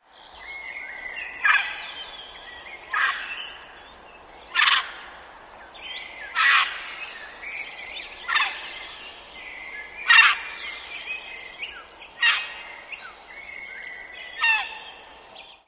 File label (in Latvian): balsis; rudbazi; i.folkmanis